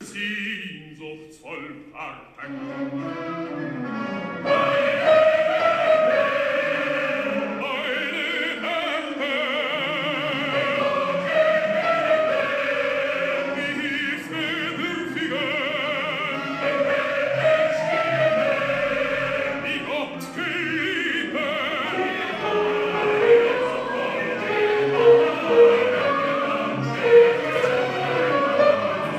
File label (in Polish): soprany; cardillac